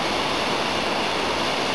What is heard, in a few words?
Trailer